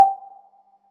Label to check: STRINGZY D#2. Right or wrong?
Wrong